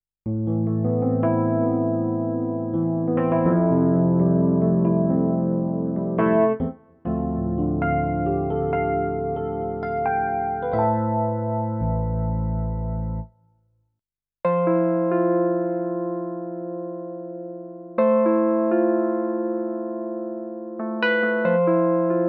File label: PX-3 E-Piano